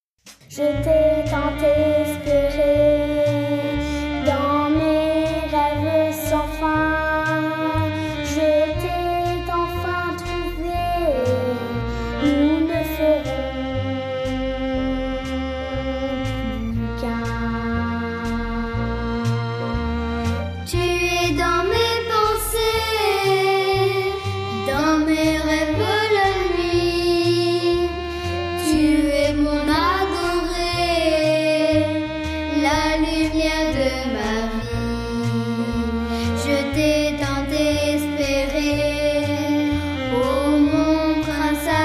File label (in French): Extrait Chant des 7 Diamants